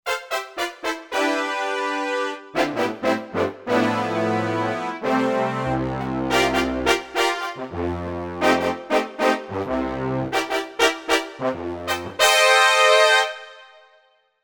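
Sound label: Brass Section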